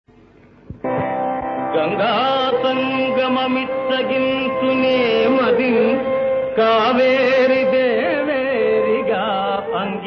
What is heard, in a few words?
Padyam; TenaliRamakrishna